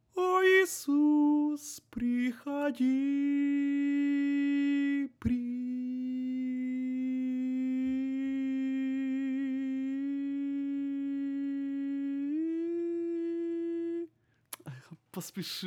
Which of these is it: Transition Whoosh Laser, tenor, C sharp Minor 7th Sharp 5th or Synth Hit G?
tenor